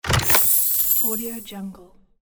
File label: open coins chest; gamechestaudio